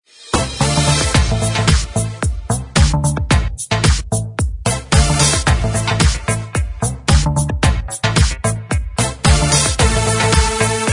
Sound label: dance loop